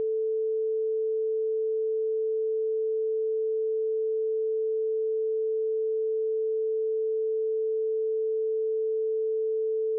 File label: stereo right only